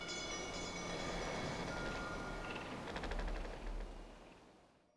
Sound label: intro shrine; ahri; lol